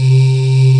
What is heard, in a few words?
VOCAL 130BPM; SONIC ELEMNT; SONIC EL YES